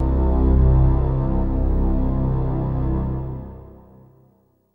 sounds music; Halo Combat Evolved; Xbox

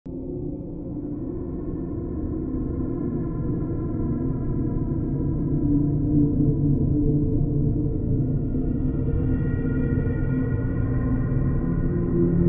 Late Ambience; Five Nights at Wario's Deluxe; Last Stand

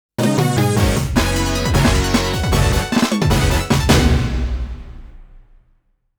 JINGLE OF RETIRE; Alpine Surfer; arcade

